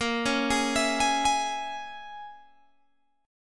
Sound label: A sharp Minor 7th Flat 5th, down, Guitar, Open G